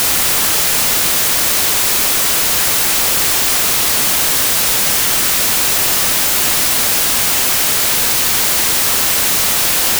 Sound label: Stereo White Noise; electronic music basics